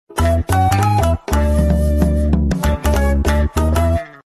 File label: nokia lumia 620 sms